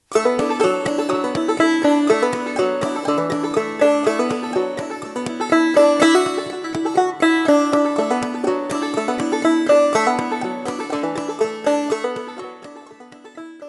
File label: Banjo Reel